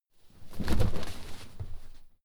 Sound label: clothes hit drop furnitures